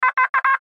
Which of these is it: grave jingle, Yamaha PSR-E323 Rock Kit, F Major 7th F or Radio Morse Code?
Radio Morse Code